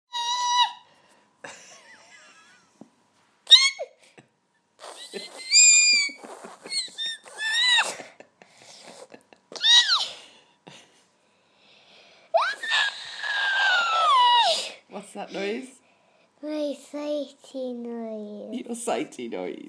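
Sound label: city noise; by smallgirltweet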